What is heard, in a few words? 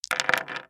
dice roll; Dice World